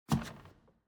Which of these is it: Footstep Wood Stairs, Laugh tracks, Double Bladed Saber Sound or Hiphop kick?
Footstep Wood Stairs